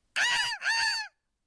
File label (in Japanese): ワシの鳴き声